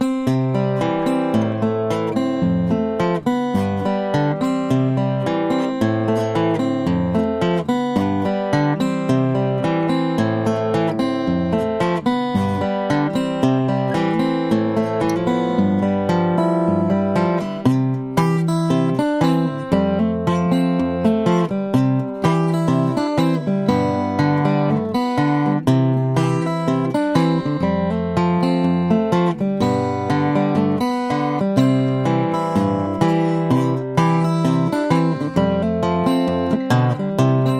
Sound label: Guitar slow